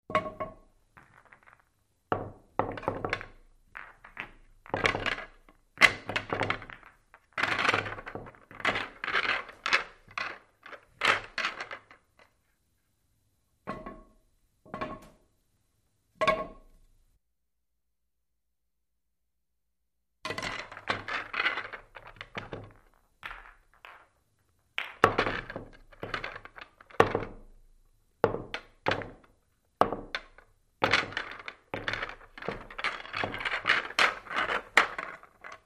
Billiards Racking

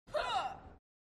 Cheerleader Yells Hu, cheerleaders

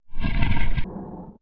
monster breath; breathing

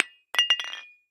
Light Bulb Drop No Break; light bulbs

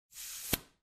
Air Hose Release Pop

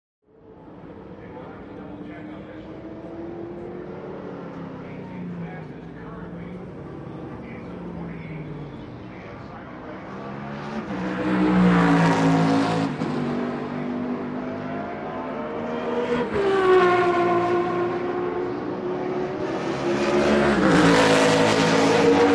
Sports Car Race Pit

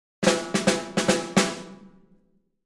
Drums Snare March

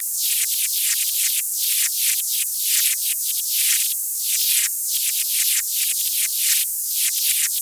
Elect Arc Elect Stat, arcs & sparks

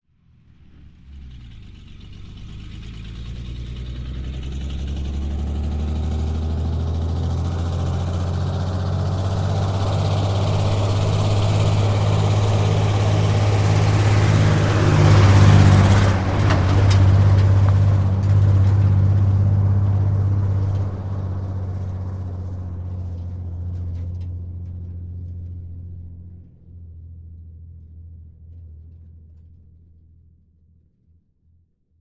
Truck 2.5 T Fast By; 2.5 ton

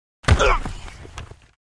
Football Block W Grun, football

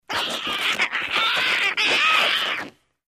Violent Cat Snarl; animals cat

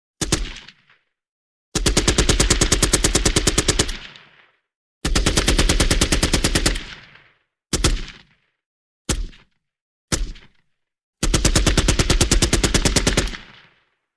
M60 Automatic Multip

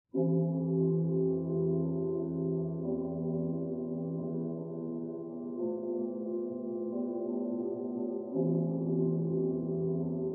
Church Bells Short; bell towers

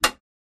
Metal Hit Light, small impacts

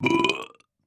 Belch Regular; belching

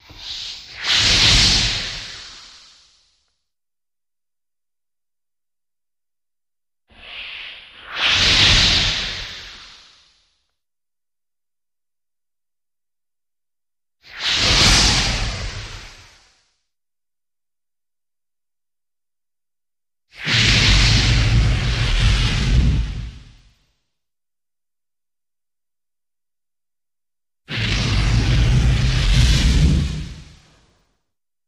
Fireball Sears; Fireball Sears Samples x6

